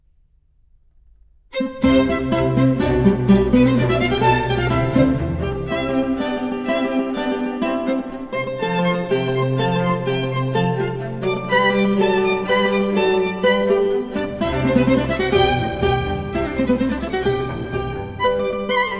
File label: Sonata for Lute Violin and Continuo, Arr. for guitar; Vivaldi; RV.82